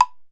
TEMPLE BLO, E-MU Formula 4000; E-MU Formula 4000 Series Vol. 5; Protozoa; Proteus2 Presets

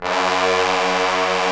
BRS TBNS, Bone Sec ff; Roland L-CD702; Bone Sec.FX

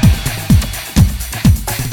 LOOP JAM, BREAKBEATS; Crypton - DrumBase5000; BREAKBEATS#4

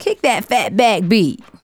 Fat Back Beat, Vocal Toolbox, Sound Bites; Sonic Foundry (Sony Creative Software)

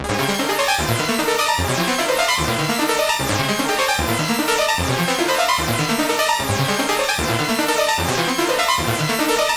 SUBMARINECMI, ARPEGGIO 150; Masterbits - Tekkno Trax

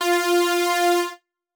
Lead - F; Instrument Oneshots; 99Sounds; Monosounds

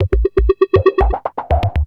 Beats, Industrial

